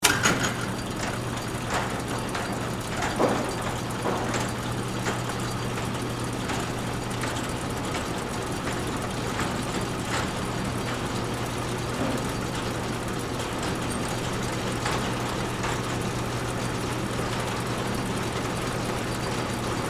Cargo Door Close, Industry, Cargo, Door, Close, Automatic; Garage Doors; Blast Wave